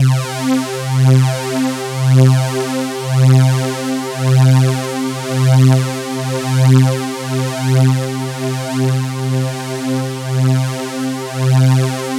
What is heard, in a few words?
C3, Synth Line; 90 s Sample CDs; NiceBeats; SynthLine VOL-28